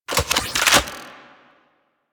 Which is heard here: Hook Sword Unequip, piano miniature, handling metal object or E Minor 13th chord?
Hook Sword Unequip